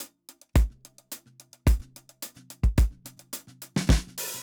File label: 70 s Vibe 108 bpm, drums; Basement drums vol 1